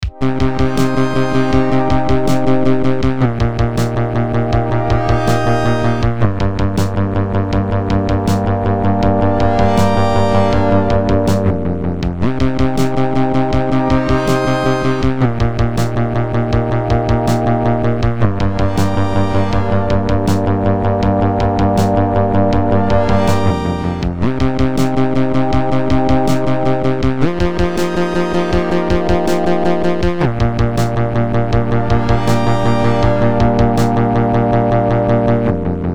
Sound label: beat